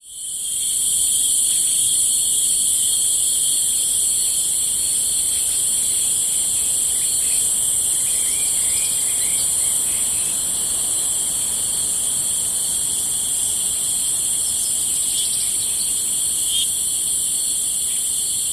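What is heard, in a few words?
Cicadas Day Ambience Background Birds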